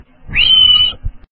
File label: beam; probe